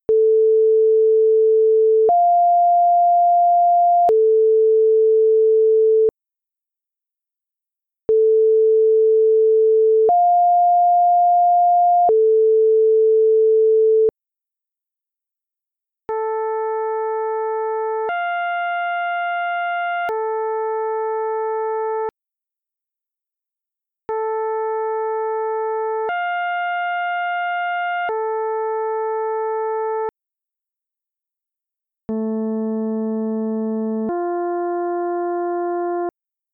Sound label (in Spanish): sextas